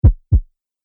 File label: Heartbeat One Shot Human; Twistedtracks